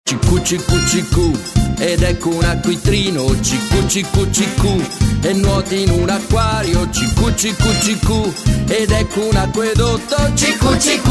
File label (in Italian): MUSICHE BAMBINI